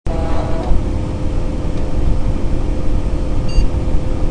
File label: power on